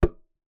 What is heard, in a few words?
Key Noise, Melodica